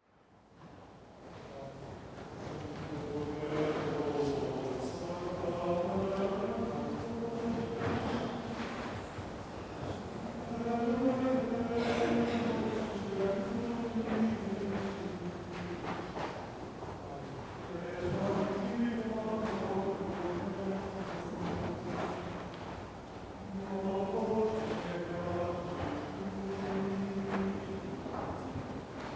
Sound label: Tantum ergo Chant